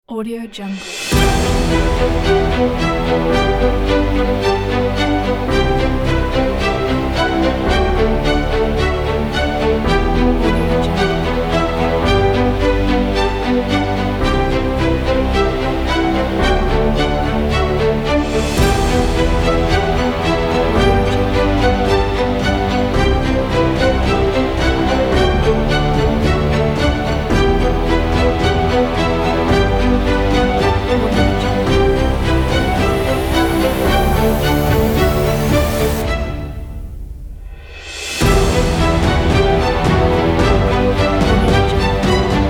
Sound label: cinematic dramatic